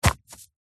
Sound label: sport soccer boots drop on grass; zapsplat